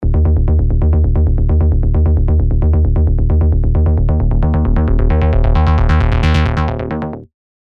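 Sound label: Bass, Sylenth1; Mind Harvestor; alonso 18 year anniversary pack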